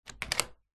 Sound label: household door office open small room; zapsplat